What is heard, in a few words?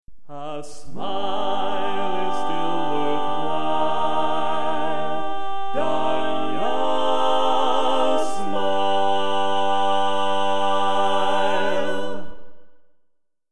Tenor